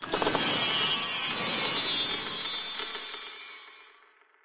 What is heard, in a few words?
drop coin